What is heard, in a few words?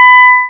Bleep